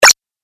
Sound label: 8 Bit Style Collect Blip; Video Game Sound Effects